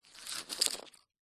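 leisure poker chips handful pick up scoop from hard surface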